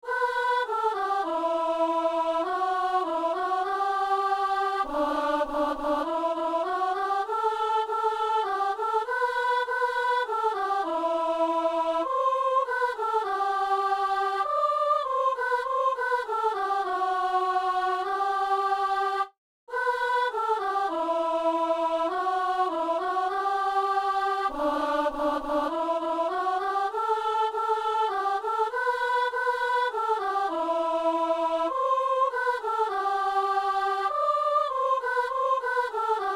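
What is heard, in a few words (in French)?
alto assemblée; Saint-Denis; Magnificat de Taizé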